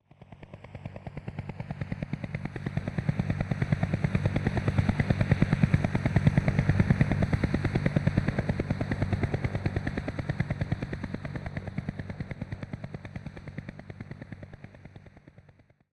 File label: Distant helicopter flies overhead